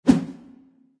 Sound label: swing; smite; odin